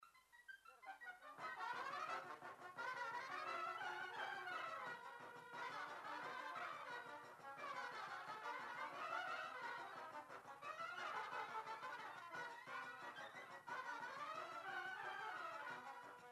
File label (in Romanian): Sarba la fanfara; jocuri si cantece batranesti; Vaslui